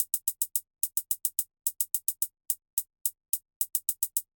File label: Hi Hat Sample